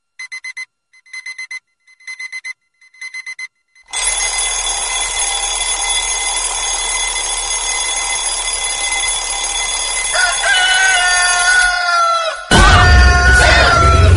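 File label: alarm ringtone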